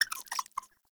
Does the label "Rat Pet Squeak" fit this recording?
Yes